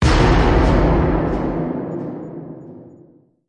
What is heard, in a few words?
Hit Big Slam Delay; Brand X Music; Brand X Music Catalogue - Editor's Essentials